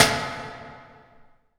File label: IMP SNR DRUM, Drum, Cinematic Impact